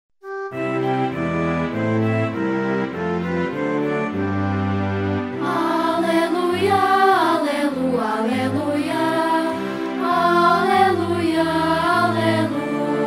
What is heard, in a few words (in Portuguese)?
Aleluia